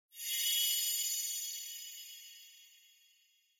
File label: Onoma Sparkle Short